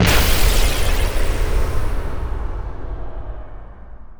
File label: sunstrike ignite apex; weapons; dota; invoker